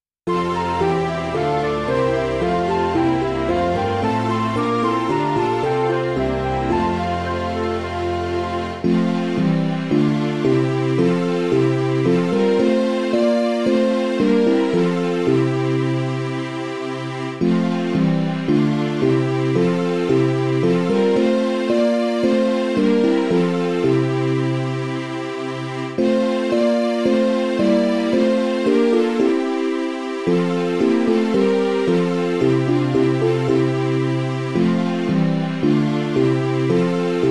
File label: midi